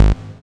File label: SYN POLY, JD 800